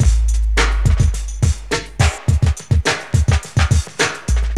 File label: BEAT 100, Beats 102-105bpm; E-MU Formula 4000 Series Vol. 1; Hip Hop Nation